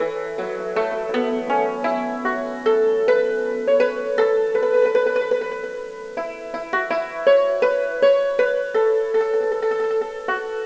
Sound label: chimandolin